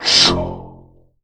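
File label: ssssshhh, tubevox dry; Q Up Arts; Denny Jaeger Private Collection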